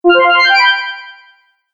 Fantasy Magic Accent; Magic Sound Effects

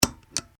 Metal Switch Click And Release

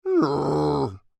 Creature Moan Irritated, Human; Groaning; Voice

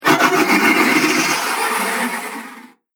Twisted Ghosts; Ghost Sound Effects; Horror Sound Effect

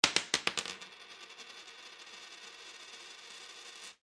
Foley Coin Nickel Drop On Counter Long; Money (Sound Effects:Objects)

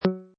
Perky, Button sound or menu sound; Multimedia

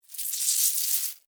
Insect Hiss Angry, Insect, Hiss, Angry, Bug; Animals:Insects; Insect Hissing; Blastwave

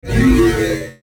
Sweeper Flange Dark Descend; Production Element Imaging Element Accent Transition; Sweeper Sound Effects

